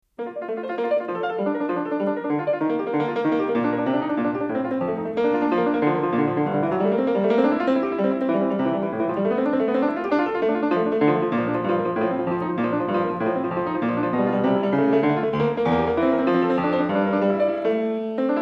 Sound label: Prelude No 2 In B Flat Major BWV 866